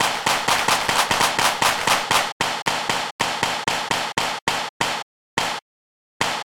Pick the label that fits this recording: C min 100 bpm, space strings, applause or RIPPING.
applause